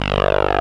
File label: Talk Brush; Mo' Phatt; Digital Sound Factory Mo' Phatt